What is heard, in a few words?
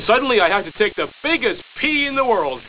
big pee